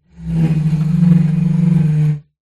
household chair dining rubber feet drag slide wooden floor